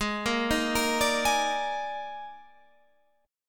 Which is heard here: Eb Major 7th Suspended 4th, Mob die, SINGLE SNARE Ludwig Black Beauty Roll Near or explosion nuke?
Eb Major 7th Suspended 4th